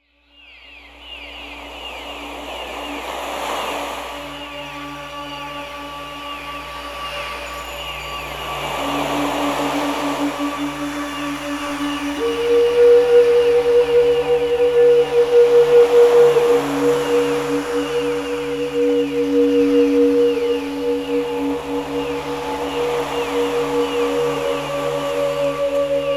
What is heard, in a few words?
Dolphin Healing 285 Hz